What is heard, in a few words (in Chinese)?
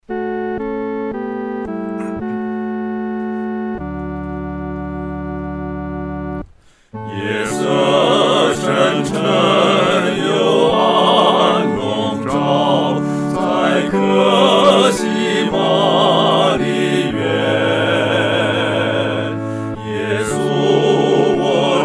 合唱 四声部; 到客西马尼